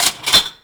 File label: buy; garrysmod; perks